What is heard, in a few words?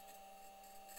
mic, mars2020 edlcam